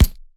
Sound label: Punching Percussion, Straight Glove (Chain), raw; 99Sounds